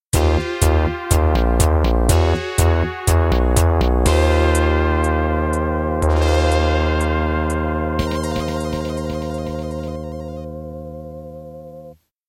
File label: Game Over; Star Cruiser; Sound of Arsys